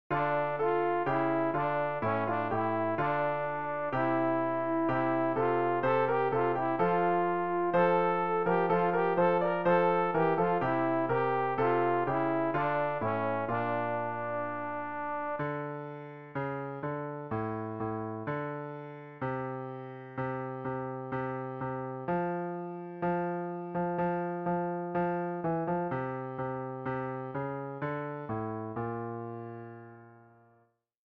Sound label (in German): alt, Übehilfen für das Erlernen von Liedern; ref gesangbuch; rg 234 agios o theos